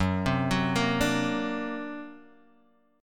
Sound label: D Augmented 7th Gb, guitar